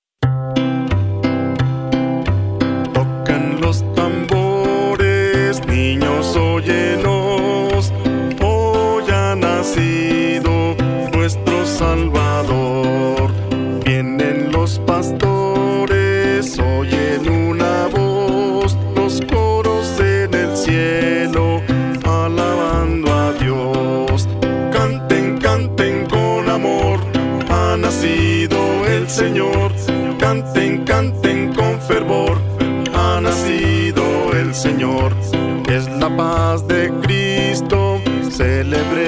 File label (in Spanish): Tocan los tambores